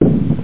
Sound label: piece place; Nothing but Tetris